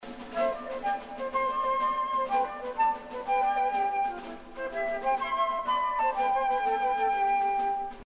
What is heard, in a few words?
original flute